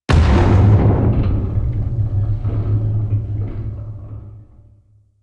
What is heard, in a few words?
Ship Damage Explosion; Warframe; DE; Vehicles; RailJack; RailJackHitEffects